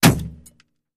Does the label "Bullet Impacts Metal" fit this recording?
Yes